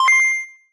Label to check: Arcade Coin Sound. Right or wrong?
Right